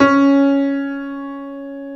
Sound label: C#3, GRAND PIANO; InVision Interactive; Lightware VOL-1; Instruments & Percussions